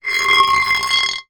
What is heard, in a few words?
foley small metal pipe scrape concrete; zapsplat